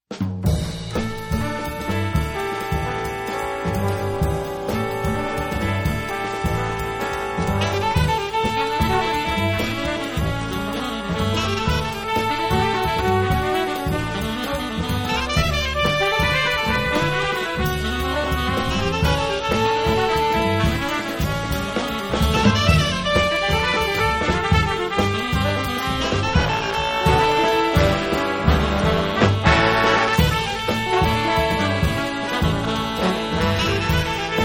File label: blues in a